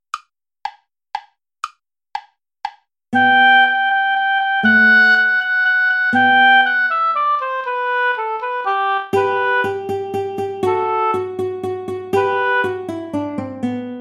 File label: play along without guitar; old hungarian dance